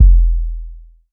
Kick Punch

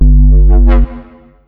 Horn Womp

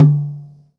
Tom High

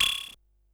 bleep

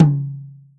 Tom High

